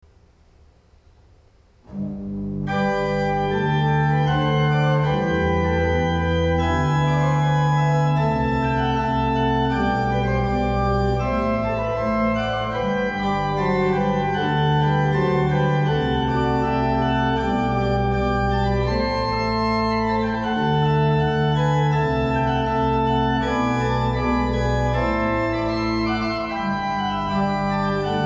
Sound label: Organ Small Sinfonia